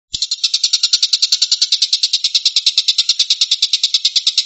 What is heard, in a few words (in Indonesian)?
Suara Tembakan Burung Tengkek Buto Gacor Jernih